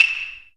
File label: percussion, Ethnic Perc